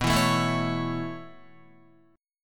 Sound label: B 9th Suspended 4th down Guitar 4F,4A,5C,5G,5C,6E; B9sus4; strummed; JGuitar